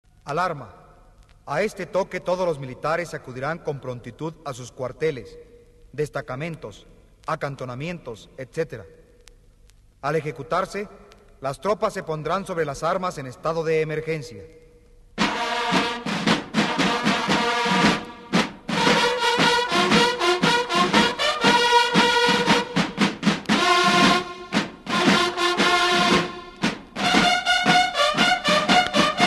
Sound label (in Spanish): alarma, toques militares; Secretaria de la Defensa Nacional